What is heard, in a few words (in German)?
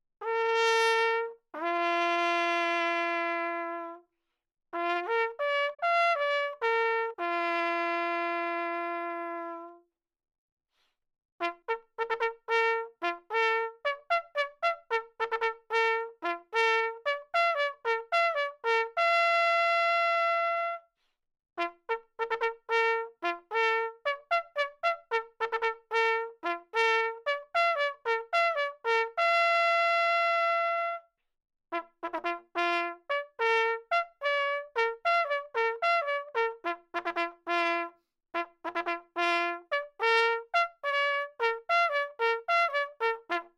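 Begrüßung, Allgemeine Signale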